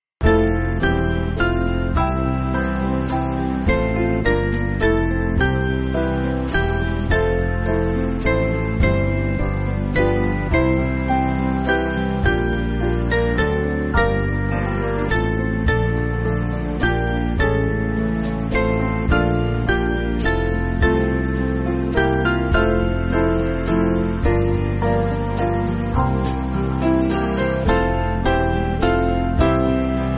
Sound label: Happy Are They, Band; Binchester